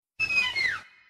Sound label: hoot